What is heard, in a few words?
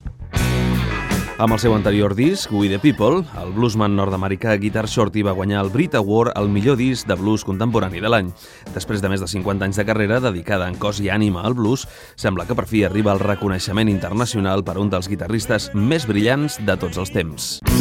guitar blues